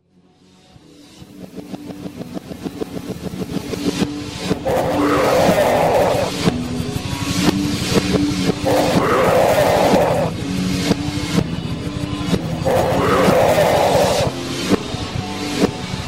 live distortion

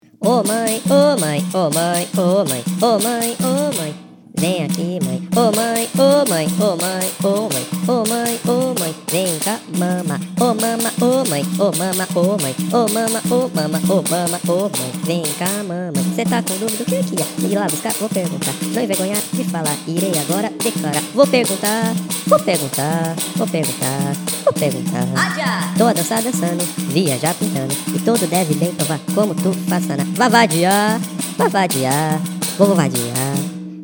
pot pourri speed 140x bpm 125

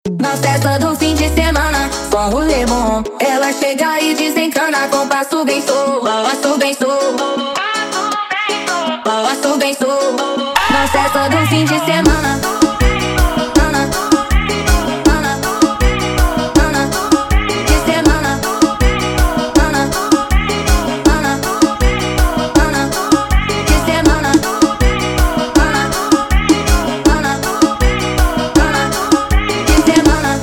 forro remix bass dj

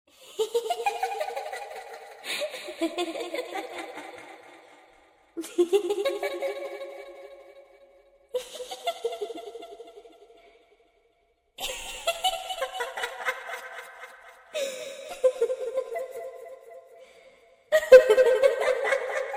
creepy little ghost girl laughing horror sound

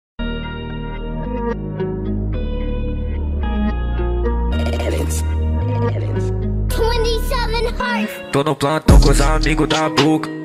trap; rj

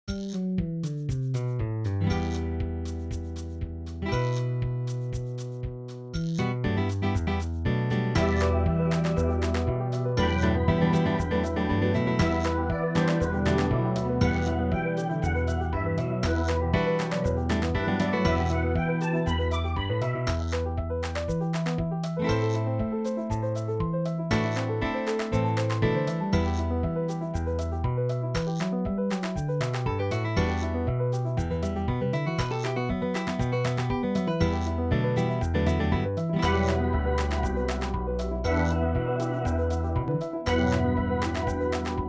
recall instrumental

